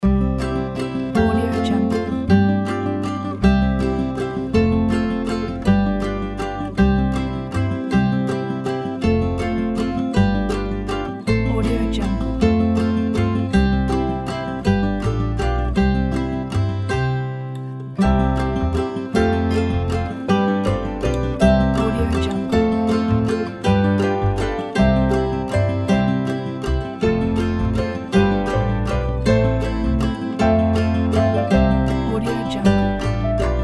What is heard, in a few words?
ukulele and morning bells